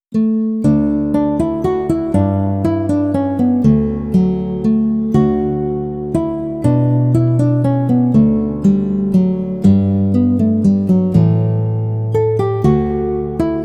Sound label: Easy Picking, DADGAD; Lord Inchiquin